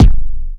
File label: dry kicks; Quasimidi Rave-o-lution 309